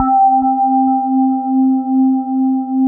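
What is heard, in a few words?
mellow square; Pro-Rec - Synthsonic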